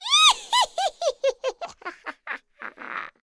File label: laugh evil female